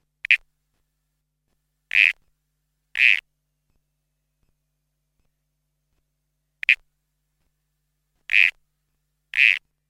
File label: Pseudophryne bibronii (Brown Toadlet)